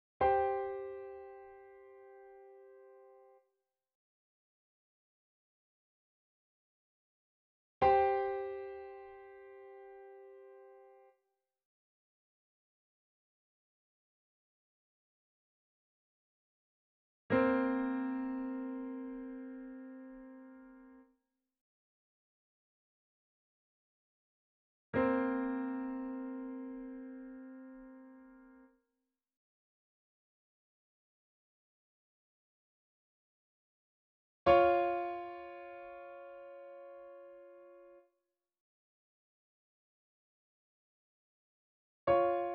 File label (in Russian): zadanie kazhdyy interval zvuchit 2 raza